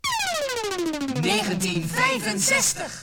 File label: jinglepackage; topformat LP jinglepackage 1